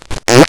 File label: fart